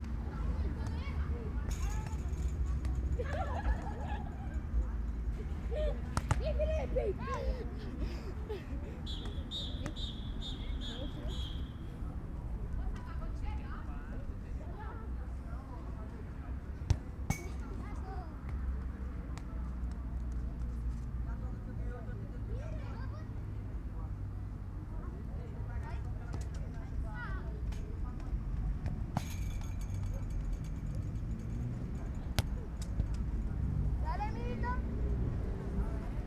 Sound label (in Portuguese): Quadra de futebol sao sebastiao com criancas, transito e apito no inicio